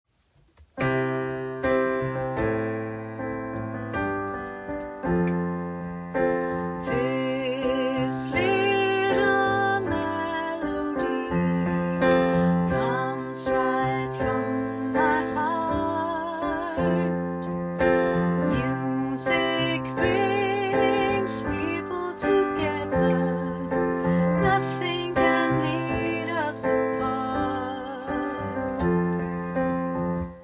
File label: little melody